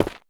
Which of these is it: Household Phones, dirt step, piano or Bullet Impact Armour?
dirt step